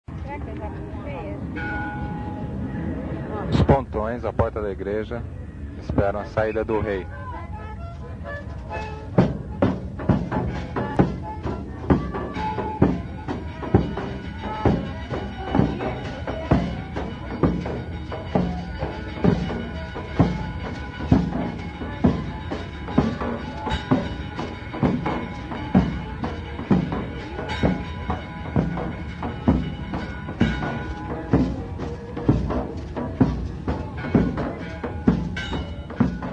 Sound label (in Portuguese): festa do rosario saida do rei banda